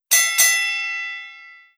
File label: rest; harbour-boxing-timer